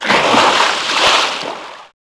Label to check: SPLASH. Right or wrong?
Right